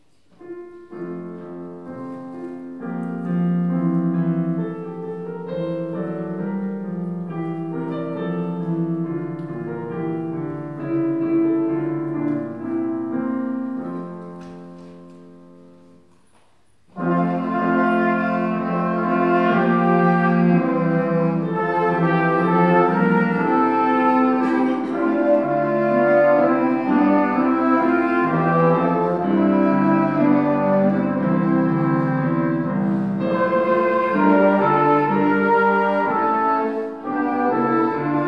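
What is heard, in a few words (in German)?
Herbei, o ihr Gläub'gen Instrumental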